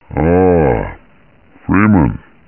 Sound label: alert; svencoop; uboa; ichyhakase